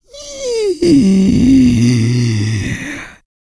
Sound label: VOX FX, BREATH FX; Spectrasonics; Vocal Planet; Jazz FX